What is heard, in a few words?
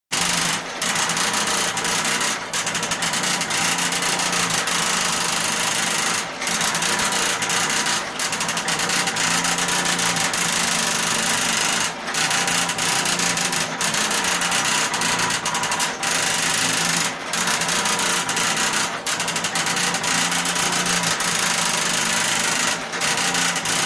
Industrial Printer, printers